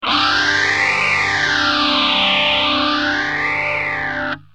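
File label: guitar, phase 100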